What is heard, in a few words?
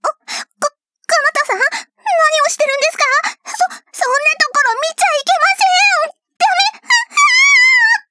alto